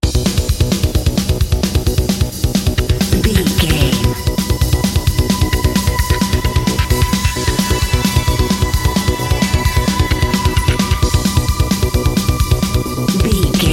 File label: extreme racing video game level narrative